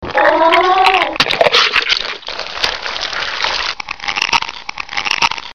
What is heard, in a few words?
scary creature